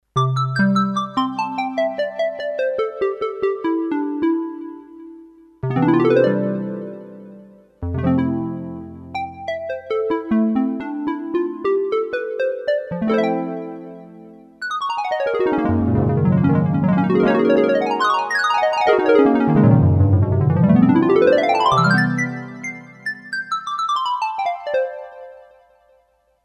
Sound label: New Age Harp, Roland D-10; Factory Patches